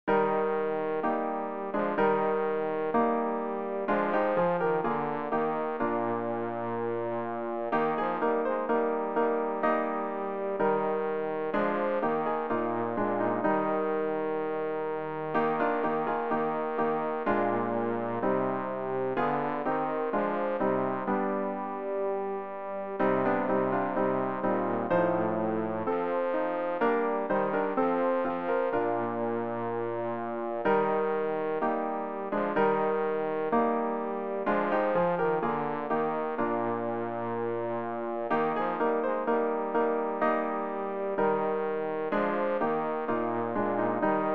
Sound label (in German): bass; Ref. Gesangbuch; RG 485: Dir, Auferstandner, sei der Lobgesang